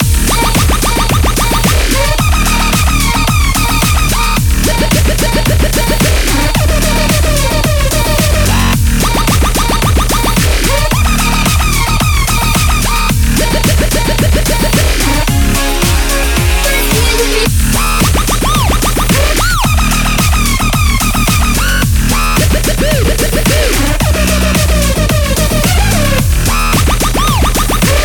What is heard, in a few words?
DUBSTEM